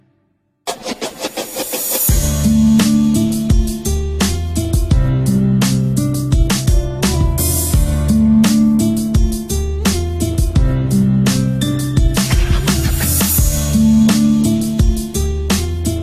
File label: acoustic guitar beat